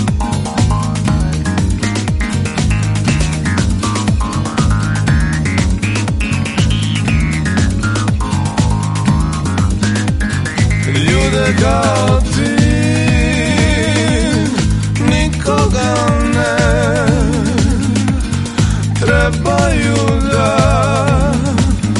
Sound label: arpeggio